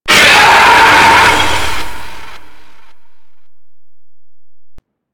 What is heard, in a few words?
Golem Melee Head Attack Swing; Warframe